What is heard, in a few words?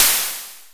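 hi-hat open, Simmons SDS5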